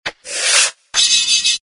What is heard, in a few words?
slice o matic